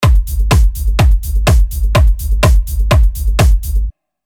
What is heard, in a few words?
drum